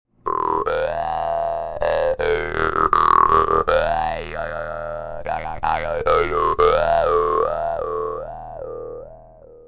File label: doromb lapat